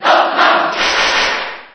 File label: Rockman Cheer JP; SSB4